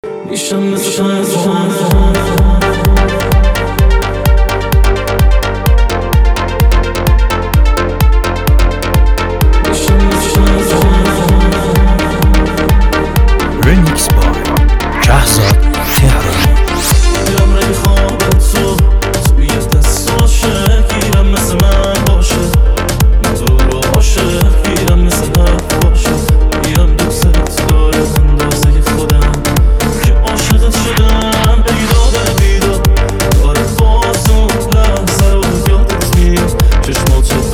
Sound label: Remix